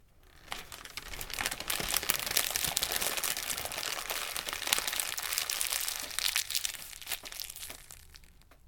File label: wadding up